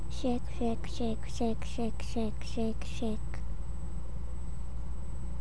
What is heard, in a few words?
shake